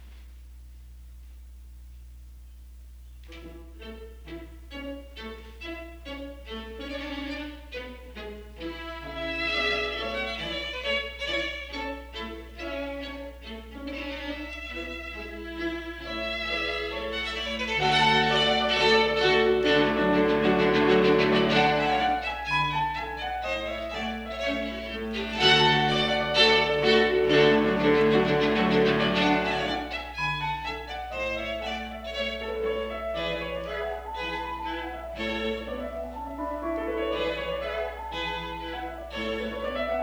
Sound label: Piano Quintet in A